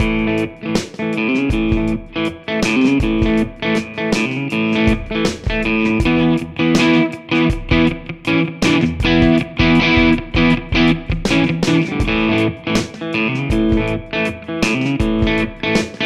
Fender American Original 60 Jazzmaster Bridge Pickup